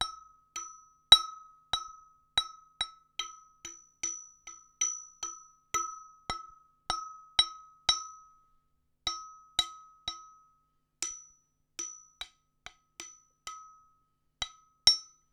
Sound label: Stem Damped Montage Hits, Large Wine Glass